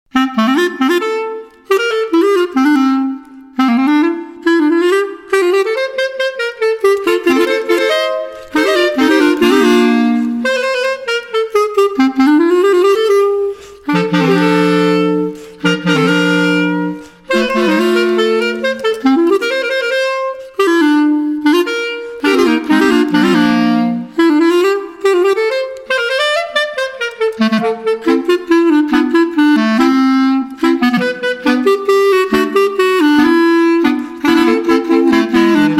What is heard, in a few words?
MEDIEVAL TIMES CLARINETTES